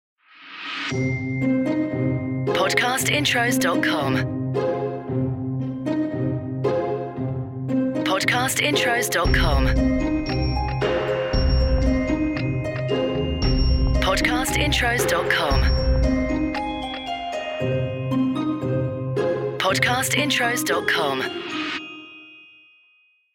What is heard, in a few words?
Podcast Watermark CORPORATE Happy